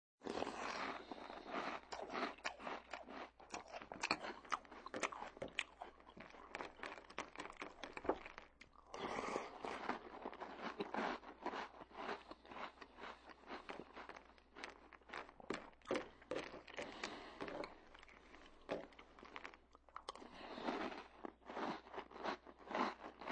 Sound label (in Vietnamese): Am thanh an uong